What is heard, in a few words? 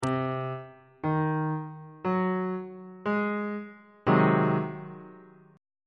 g sharp dominant 7th 1st inversion on bass clef